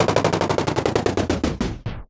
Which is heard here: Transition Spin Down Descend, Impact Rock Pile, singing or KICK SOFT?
Transition Spin Down Descend